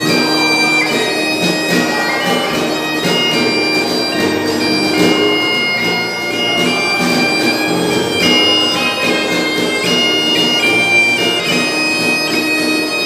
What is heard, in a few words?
Bagpiper